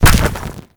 Colossus Hand; Warframe; Colossus Boss

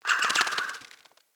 flock bird attack dive; Enemy; Sinecure; Ranged Hunter Thawtide; Abilities

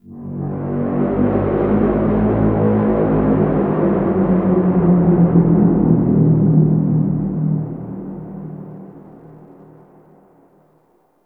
Terraforming Egg Vocal Wet; Warframe; Tau

